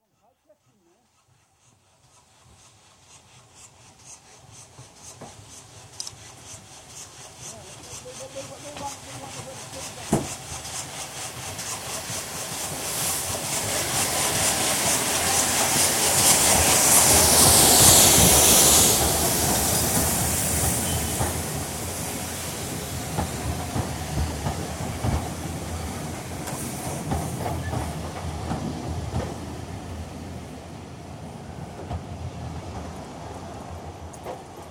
Steam Train arrives at station, from left, squealing brakes & doors; BBC